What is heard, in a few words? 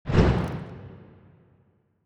expand horizontal out whoosh; biogenesis